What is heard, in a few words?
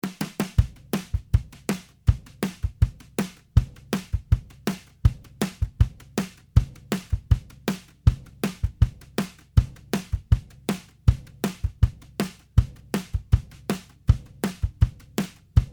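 country, full mix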